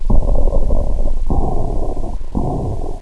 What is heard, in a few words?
heavy stop